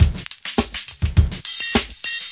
roundabout clicker, 103 bpm, drum loop; 101 to 110 bpm

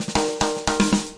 120 bpm, drum loops and breaks

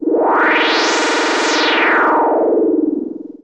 old farty arp filter, Synth

